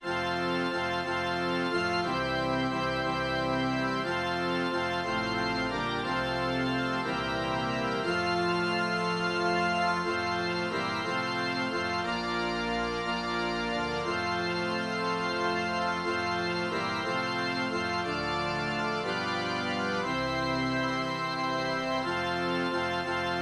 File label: organ